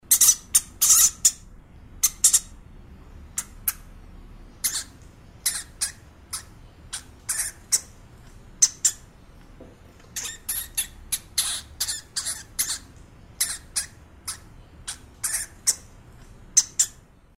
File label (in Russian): zvuk homyaka